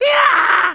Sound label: pain; fatbuu